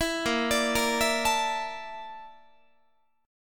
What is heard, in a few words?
A sharp Major 7th Flat 5th A sharp Guitar; arpeggiated